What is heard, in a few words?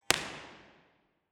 fig8 impulse response processed; NCEM